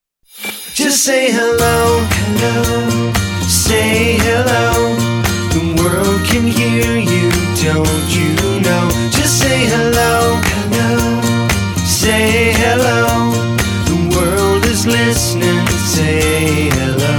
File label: Say Hello, ringtones; Qiku; Luna Youth